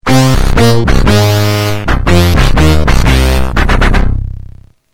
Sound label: Noise Lead, HardSID